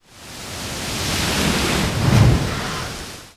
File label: splash